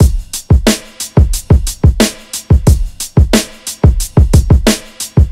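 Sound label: shimmy snare, Boombap Drum loops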